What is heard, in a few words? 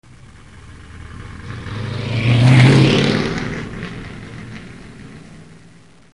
zoom by